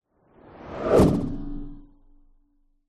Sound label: science fiction whoosh element pass by machine vehicle ultra fast